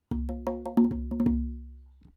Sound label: accompaniment; variation A